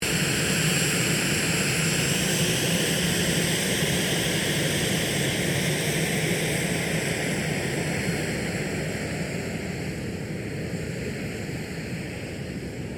AIRCRAFT CESSNA A-37B DRAGONFLY TAXI, Jet Fighter /Bomber; Airplanes; Vehicles